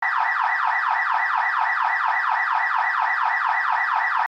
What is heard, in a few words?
CarAlarmAuto